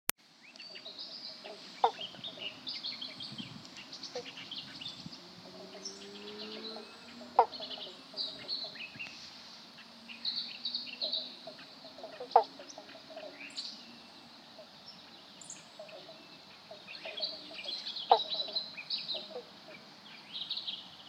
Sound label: banjo frog call